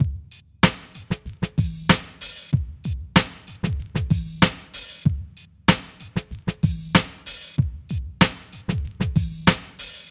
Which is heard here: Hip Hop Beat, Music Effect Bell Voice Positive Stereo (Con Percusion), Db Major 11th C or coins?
Hip Hop Beat